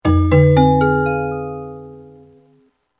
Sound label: suspicion; horror